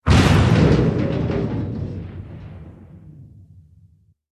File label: Huge distant bomb explosion